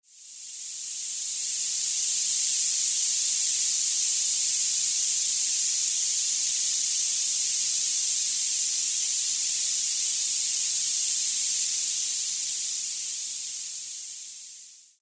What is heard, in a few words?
Tinnitus Descender Noise Masking